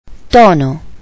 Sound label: dial tone, telephone